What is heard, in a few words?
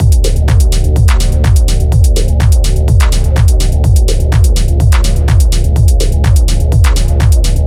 Drum Loop Fm 125bpm